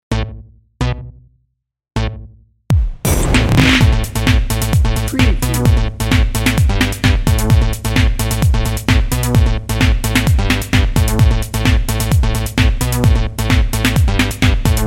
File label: electro energy dnb